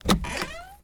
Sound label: DOOR Metal Open Creak; mono; Universal Sound FX; DOORS & GATES